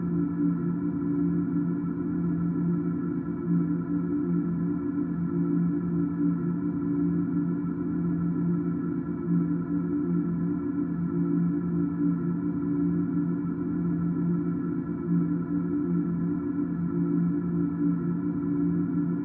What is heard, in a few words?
Ship, ambience